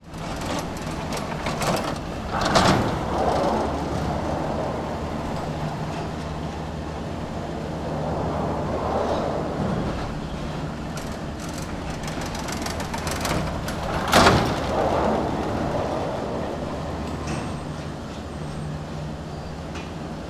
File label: transportation cable cars arrive station france luchon superbagneres